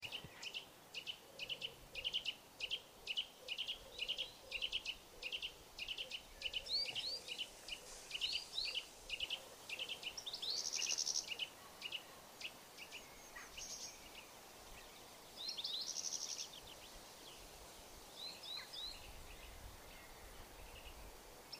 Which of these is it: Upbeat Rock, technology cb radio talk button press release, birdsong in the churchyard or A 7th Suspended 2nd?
birdsong in the churchyard